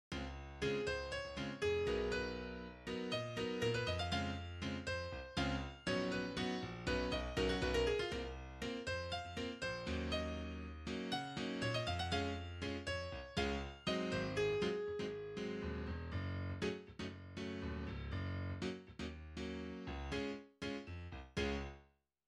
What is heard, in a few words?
groove inverted